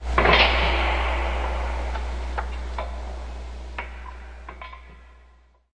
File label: forklift, Factory Percussion; UNUSUAL KITS and FX